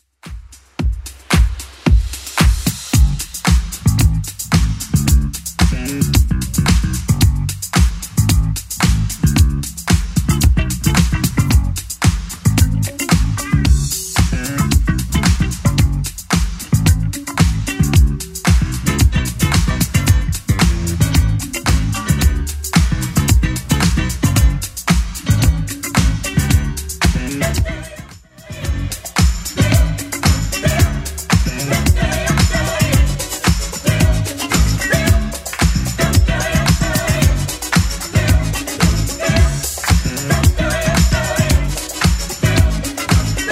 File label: 80s Club 112bpm CLEAN